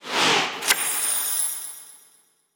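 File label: Digital Card; Yu-Gi-Oh! Master Duel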